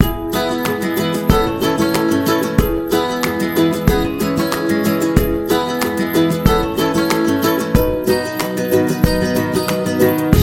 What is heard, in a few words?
Indie Folk Guitar, happy music; happy music vol 2